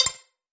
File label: Clicky